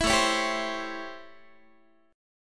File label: A 7th Sharp 9th, Guitar; A7#9; strummed